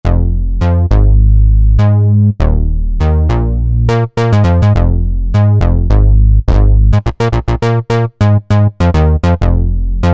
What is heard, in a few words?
OB Bass, Roland SRX-07; Roland SRX-07 Ultimate Keys